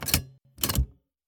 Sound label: lever